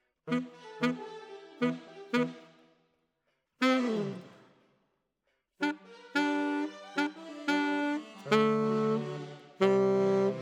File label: Alto, RNR for piano